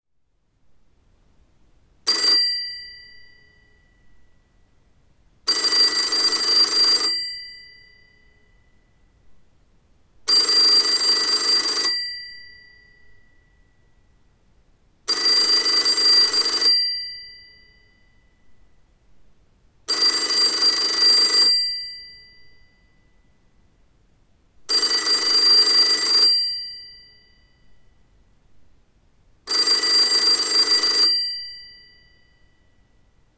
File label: Ring ring